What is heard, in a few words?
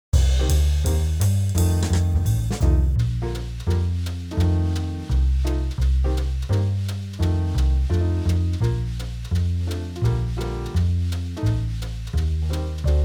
Bb 170, Backing tracks; jazz musicians to practice songs that are in the Vanilla Book